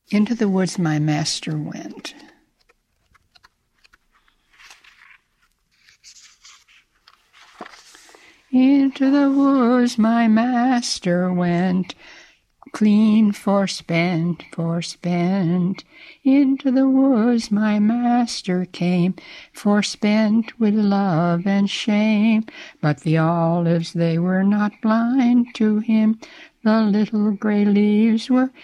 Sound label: hymn